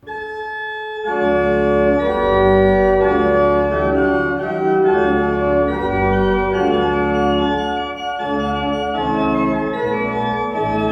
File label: Becker, pipe organ